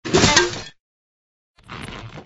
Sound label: drop flowerpot